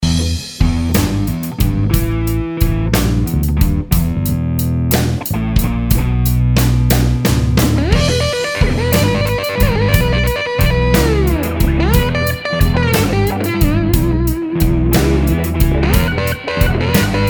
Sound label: slide mix, guitar